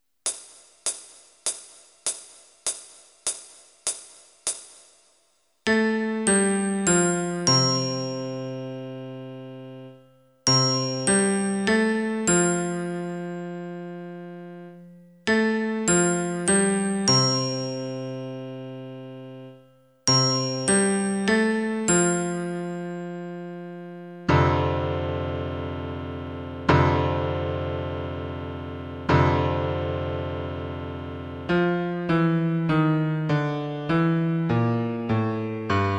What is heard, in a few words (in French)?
big ben beat